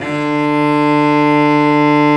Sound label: STR Vc Arco p